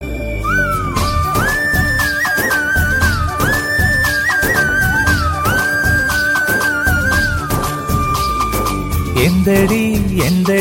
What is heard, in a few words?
malayalam cut song tune ringtone